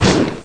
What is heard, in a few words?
FIREBALL; Ferazel's Wand Demo